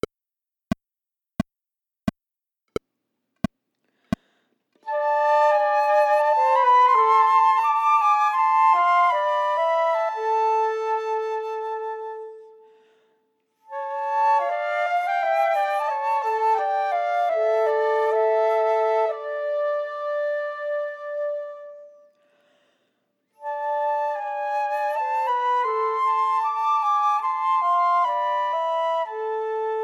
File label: chorale chamber music for 3 flutes minus 2nd part; Bach